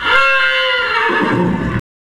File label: Horses Neg Low